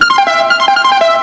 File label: MUSICAL